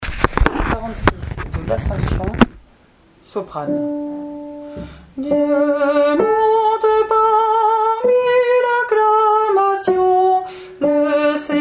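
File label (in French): Psaume Dieu monte parmi l'acclamation Soprano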